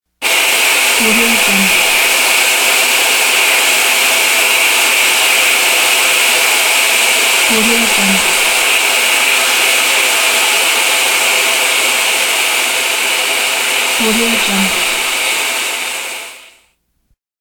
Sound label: steam